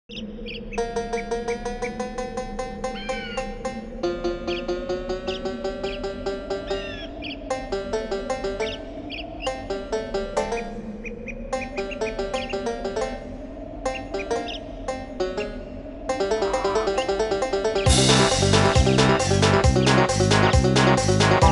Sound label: Main Titles; Banjo-Tooie